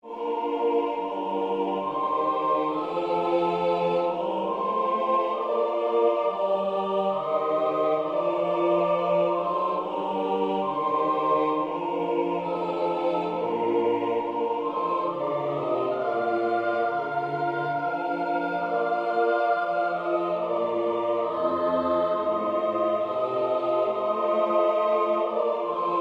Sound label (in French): hymne national anglais